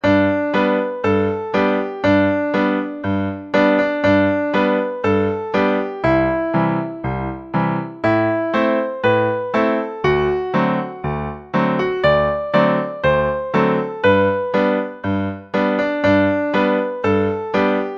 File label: jingle bells